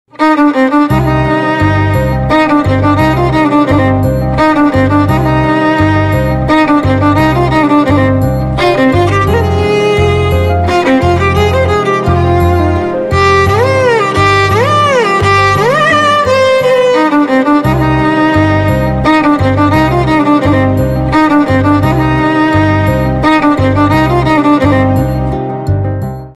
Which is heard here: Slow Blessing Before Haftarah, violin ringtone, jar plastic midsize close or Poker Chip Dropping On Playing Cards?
violin ringtone